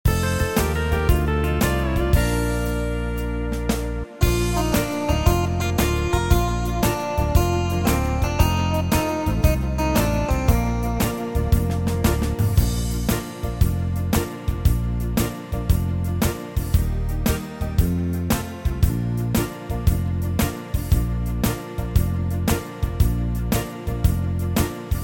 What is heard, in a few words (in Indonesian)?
Instrumen Organ Gerak